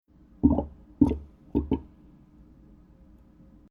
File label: Bubbles Home Brewing Beer Airlock Sporadic Bubbling Beer Wine Cider Co2; Keg Sound Effects